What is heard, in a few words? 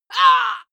female combat hit soft; schreie; menschen